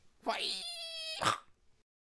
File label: Balloon Archer Attack Vocals